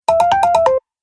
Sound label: Message Notify Sound, Dong